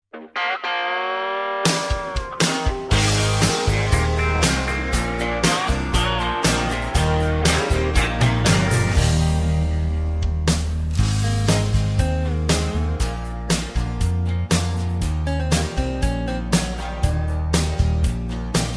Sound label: Eb No Backing Vocals, backing tracks